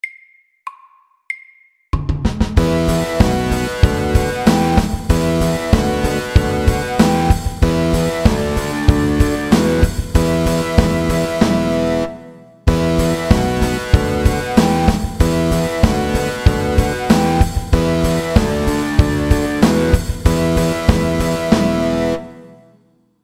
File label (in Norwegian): Mountain rock MIDDELS